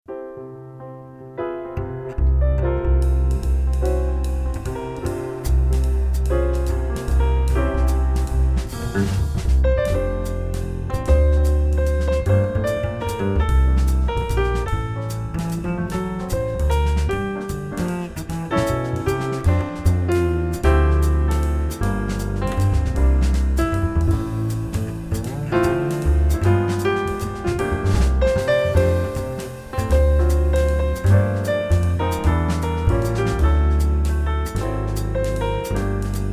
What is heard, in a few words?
waltz